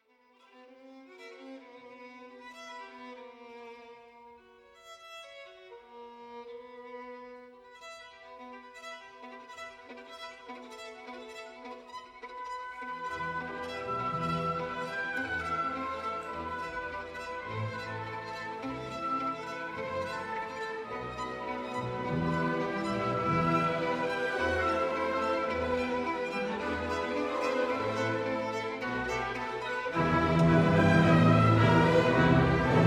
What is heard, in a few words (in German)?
Mendelssohn Violinkonzert